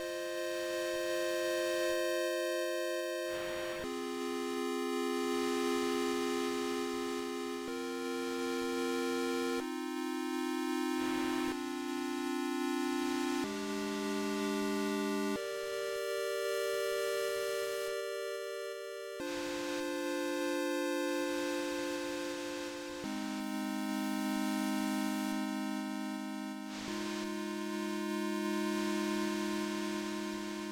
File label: leaves